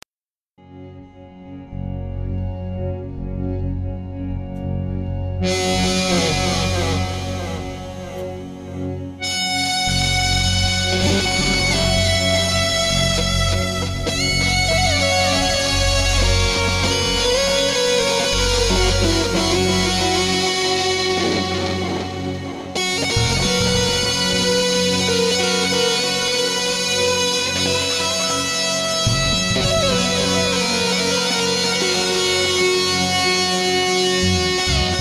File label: bagpipes